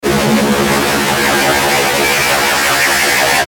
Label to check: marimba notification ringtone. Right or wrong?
Wrong